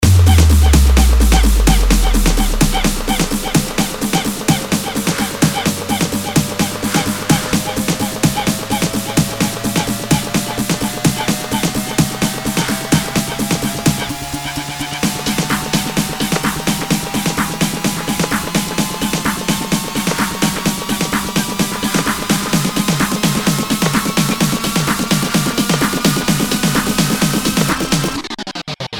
Afro Tech Original Mix bpm 128

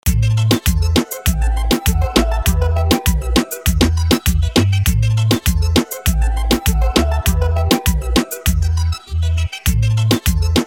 intro 100 bpm

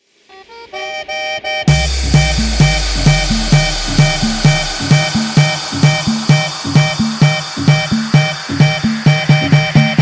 guaracha remix 130 bpm; Bootleg Remix Club Mix

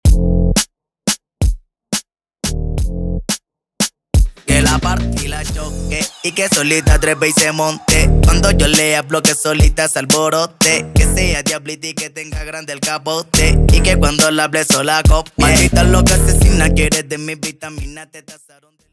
Intro Outro 88BPM

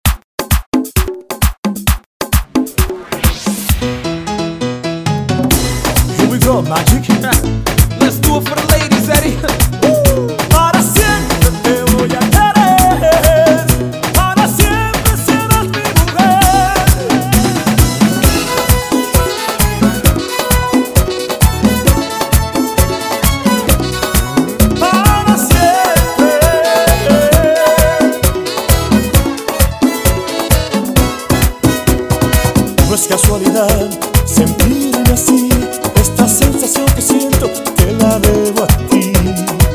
132 bpm

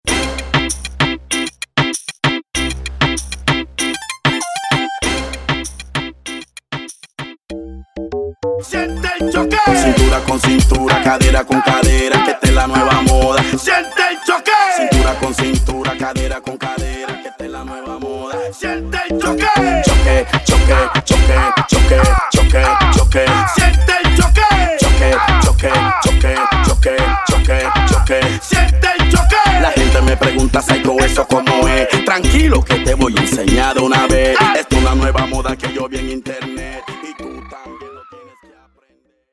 Intro Splice 97 BPM

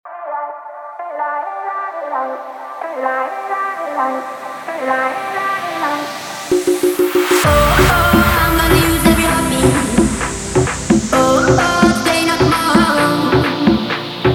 intro outro 130 bpm

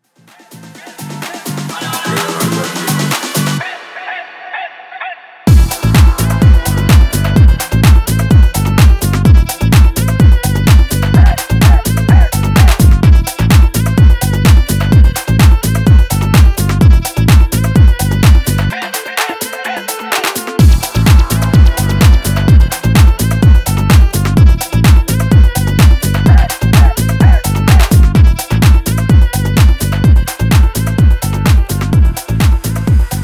calypso tech remix 124 bpm

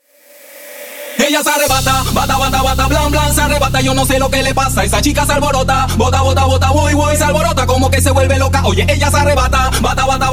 intro outro 125 bpm

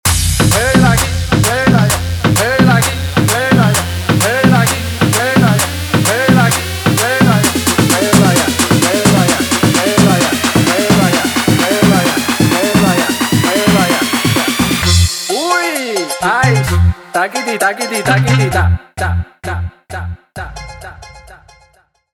intro outro 130 bpm

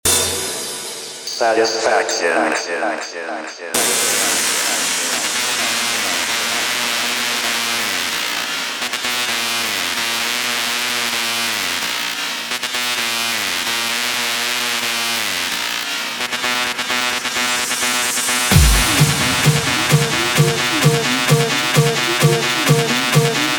intro outro 130 bpm

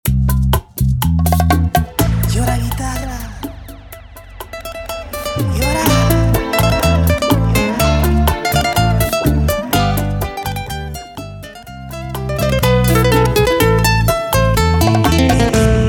Intro Break Outro 124 BPM; Prodeejay Remix

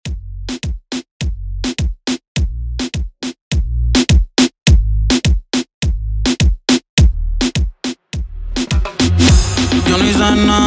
Intro Outro 104 BPM

